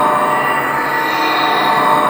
flask of crystal water loop; LoL; items

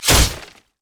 basic attack claw; rengar

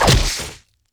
wolfman vs flesh; LoL; warwick

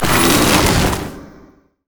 sap magic melee heal; maokai

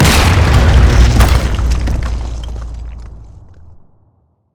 turret break; chaos turret

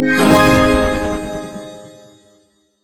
crescendo; LoL; sona

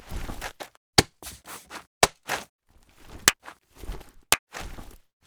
recall loop

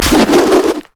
bola strike; rengar

